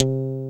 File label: E-GT LO